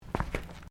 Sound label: FOOTSTEPS THONGS (FLIPFLOPS) TILES STEP; Footstep Foley; Footsteps (Sound Effects:Human)